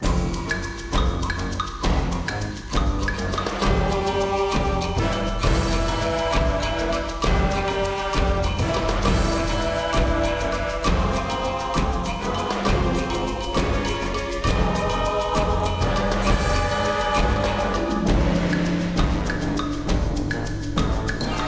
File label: Big Puzzle LOOP